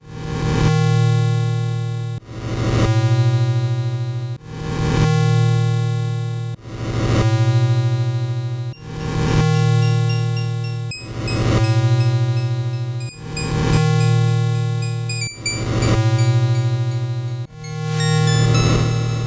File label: 8bit bgm fast